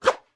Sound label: Bottle Swing; Swing a bottle (Recorded)